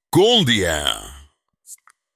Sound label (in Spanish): cumbia